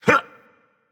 Grunt Jump Dragon; fafnir

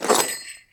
armor heavy; smite; chiron

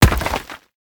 hit; smite; geb

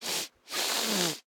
victory sniff; smite; god ganesha skin11

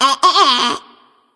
nv tongue; smite; voicepack; ratatoskr

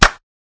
Victory Clap; smite; kumbhakarna

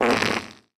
idle fart; smite; kuzenbo

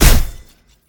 projectile hit; smite; tiamat

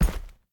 mvt footstep; smite; gilgamesh

